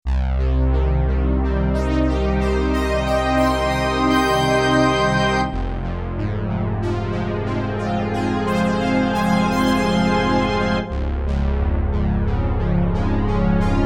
JX08 Voices

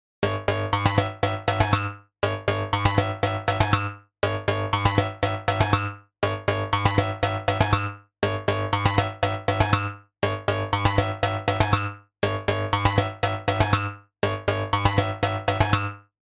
Eurodance; Kobol

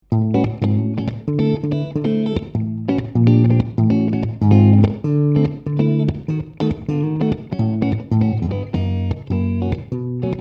Both Pickups

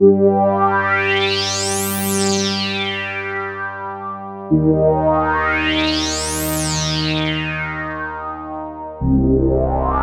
Sweeps

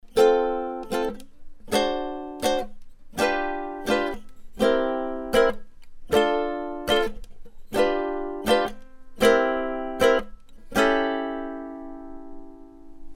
Monkey Banana Mangabey Ukulele

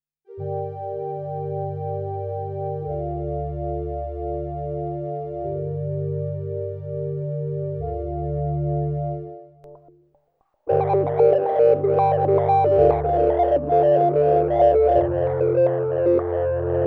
Acidbox Pad Sample Hold

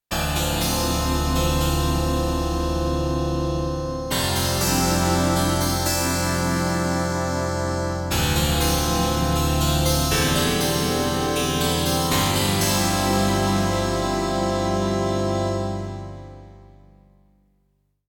Staccato Heaven Yamaha TX816 x2 in tune

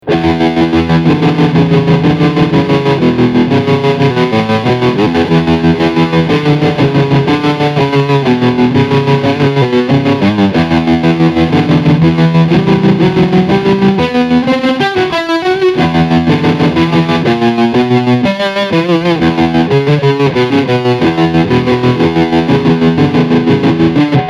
Hard Tremolo

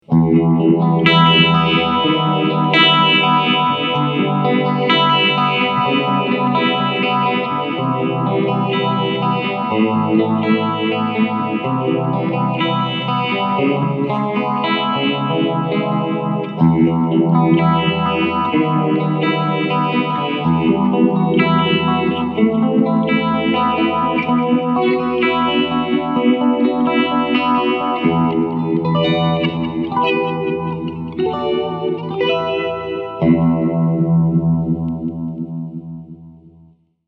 Harley Benton JamBox Clean Phaser

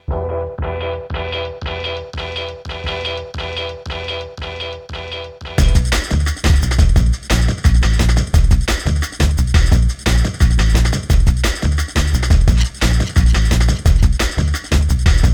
IQ Series Limiter V2 MXD C clean; HOFA

